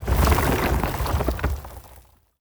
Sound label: statue shake